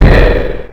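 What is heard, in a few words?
Explosion Abrupt Echo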